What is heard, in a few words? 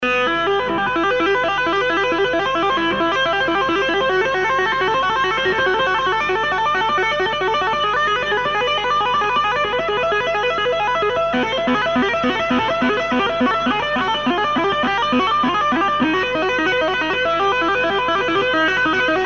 taping